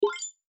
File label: button